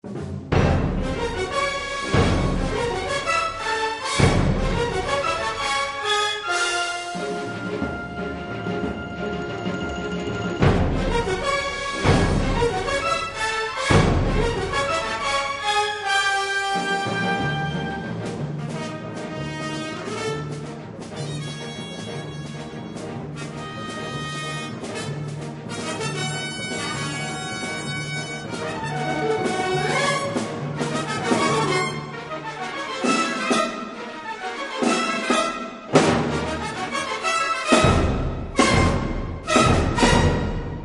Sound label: Molto allegro; West Side Story